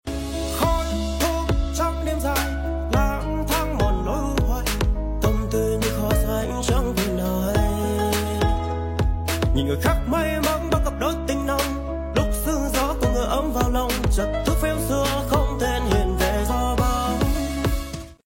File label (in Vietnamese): doc tau remix